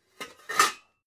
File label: pots clank